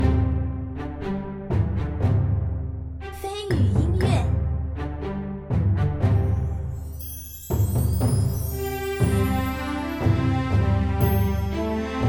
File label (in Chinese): 地图背景音乐